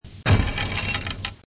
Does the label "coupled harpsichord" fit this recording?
No